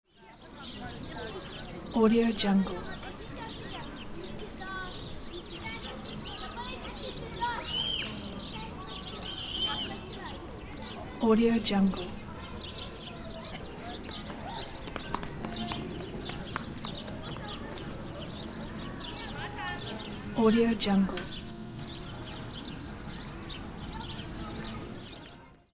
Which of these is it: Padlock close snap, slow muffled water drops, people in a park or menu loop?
people in a park